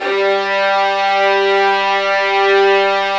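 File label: violin section, Arco Violins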